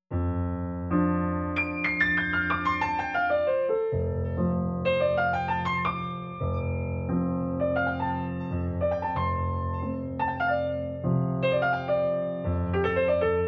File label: PIANO